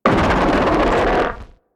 death; HAMMERHEADg

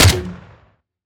rifle Grenade Shot

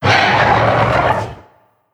HUSKED CRAWLER attack

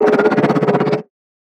HAMMERHEAD idle

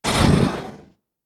MUDRAPTOR idle

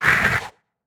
CRAWLER damage

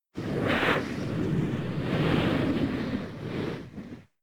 Husk Attack

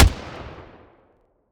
hand Cannon, WEAPONS

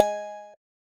Button Sound

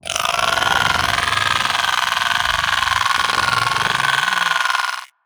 MUDRAPTOR pet Hungry

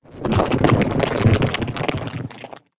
Mantis Attack

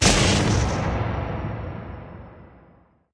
Electrical Discharger

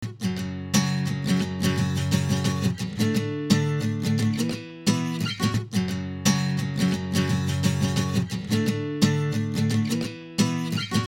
Guitar

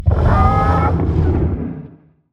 CHARYBDIS idle

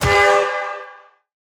Toy Hammer Hit

